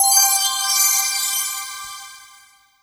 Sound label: magic